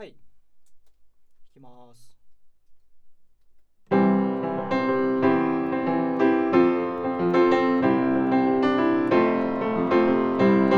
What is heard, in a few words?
Piano Over Head